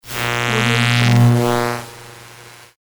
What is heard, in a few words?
electric glitch